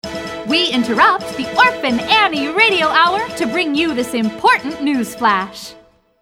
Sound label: News Flash